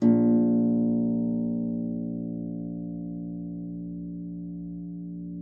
HFEM A1, HARP; Xsample VOL-8; HARP MF

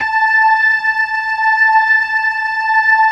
LO MAN V R, ORGAN LOWER MANUAL

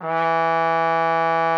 TROF E3, TRUMPET; Session Instruments; Best Service ProSamples vol.42

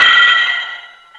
metal; Ragga & Dub Reggae; USB Soundscan vol.27; AKAI

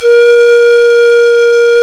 pan flute; Sound & Vision - Gigapack I CD 2 (Roland); Brass&Woods2

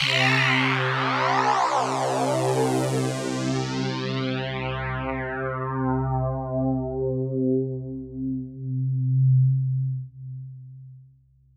NL A4 C2, STRINGS; Music Store Sound Service; Einstein

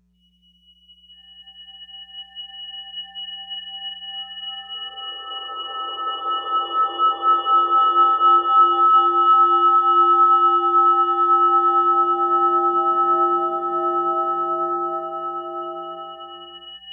SURPRIS, Ominous Drones; USB Soundscan vol.48; AKAI

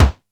BASSDRUM, D110 DRUMS; Crypton - DrumBase5000

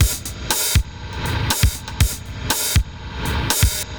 Spring Verb Compressed TR 505 120BPM

